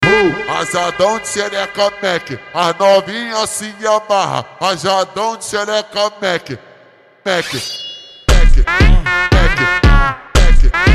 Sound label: automotivo slowed